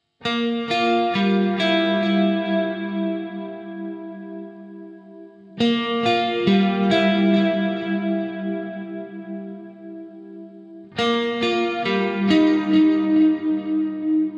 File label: Neck, vintage guitars; SHINE